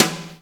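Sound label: BRUSH SNARE; WS#6 JAZZ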